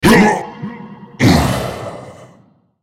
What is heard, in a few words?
dying; nasusskin05; Character Voices - English